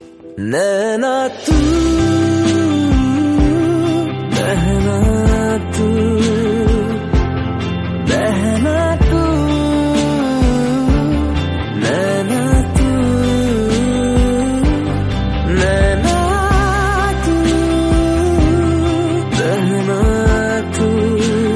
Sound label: romantic hindi song tone ringtone; bollywood ringtones